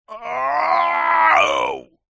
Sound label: human male scream pain